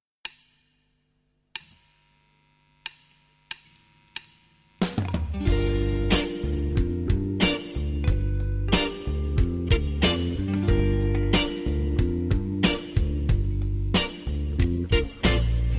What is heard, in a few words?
blues